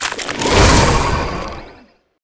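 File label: King Spider Attack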